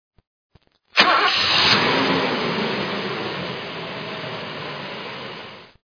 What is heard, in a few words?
start sound